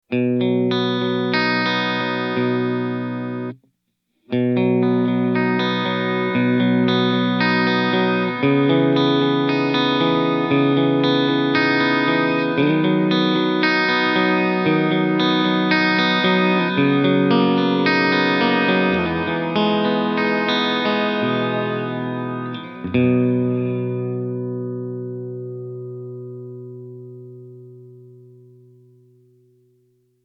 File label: Reverse Stop Arpeggios